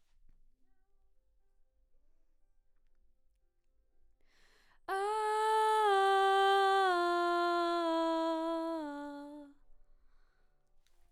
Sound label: BG Vox